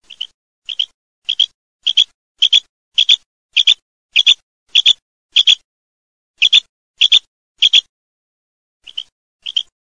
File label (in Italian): QUAGLIA